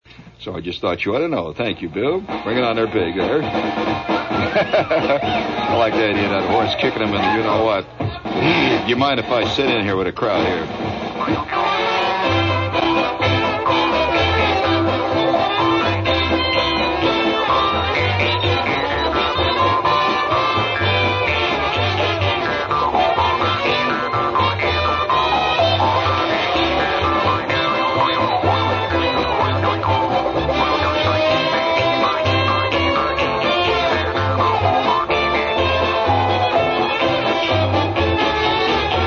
Jazz; geography

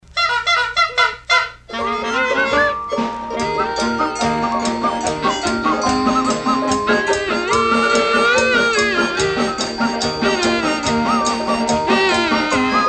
jingle bells

